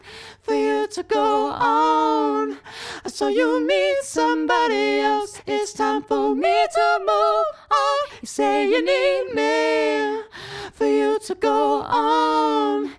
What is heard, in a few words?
mixture, singing librispeech; MedleyVox